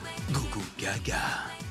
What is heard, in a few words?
baby goo goo gaga